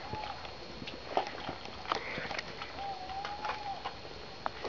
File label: Tawny Owl - hoot A; Vugrovec